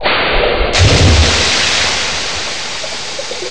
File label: Coolant Dump